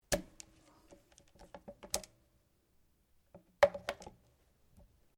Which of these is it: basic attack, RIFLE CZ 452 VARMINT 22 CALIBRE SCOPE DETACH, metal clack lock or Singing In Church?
RIFLE CZ 452 VARMINT 22 CALIBRE SCOPE DETACH